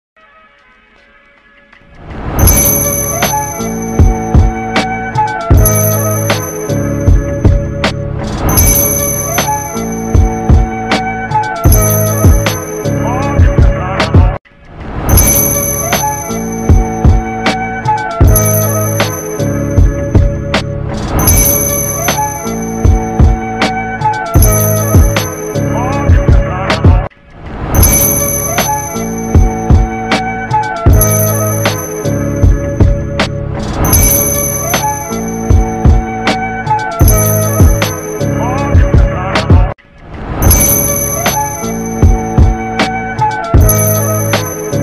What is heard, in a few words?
Remix Beat